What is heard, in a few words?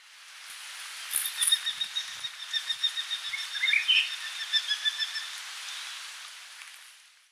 Falco severus, Oosterse boomvalk; roofvogels; Falconidae; Falconae